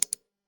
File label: sound switch on